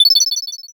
sparkle

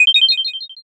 sparkle

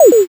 poyon

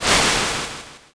swoosh

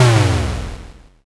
explosion s

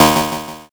zap

